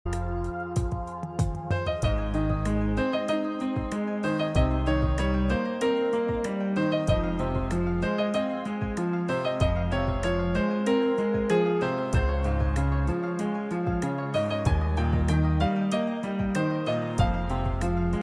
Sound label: piano version